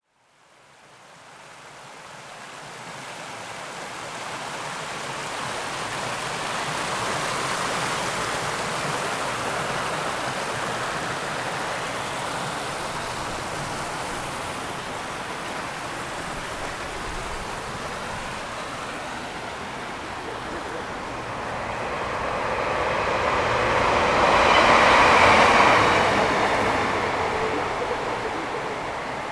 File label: River Ely Soundscape